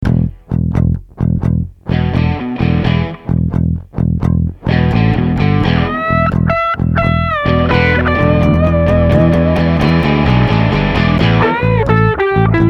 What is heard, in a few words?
Tape Recorder wet